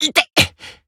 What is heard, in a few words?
Pain